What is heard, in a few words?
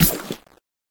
Hand Kick; Game rip; MiSide